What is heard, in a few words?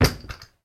leisure toy doll drop on floor